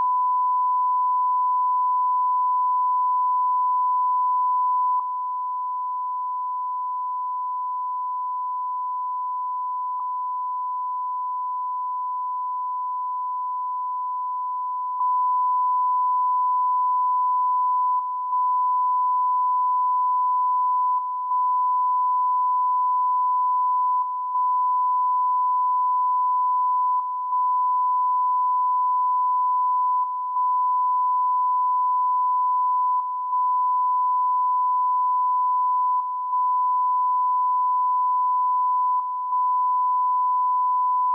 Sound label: BARS HEADER COUNTDOWN TONES -18dBFS